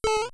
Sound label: jump; super mario bros 2 nes